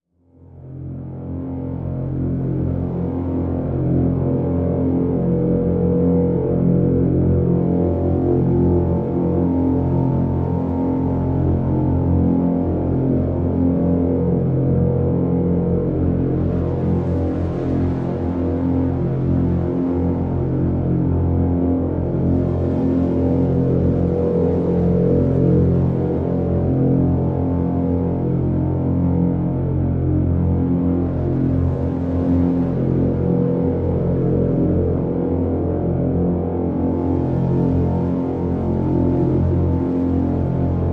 Dormant; Drn Dormant F

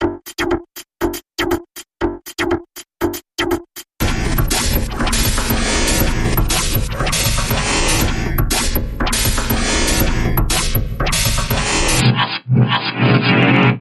Percussion Bumper Reversed

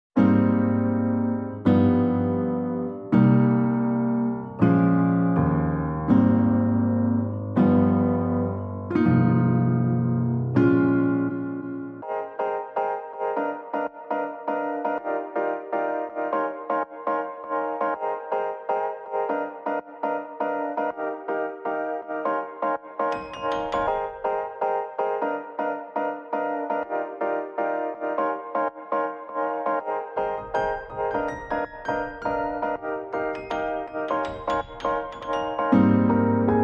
Piano Only

